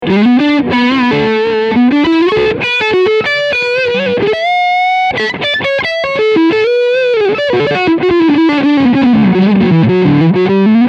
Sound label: Lead Front SC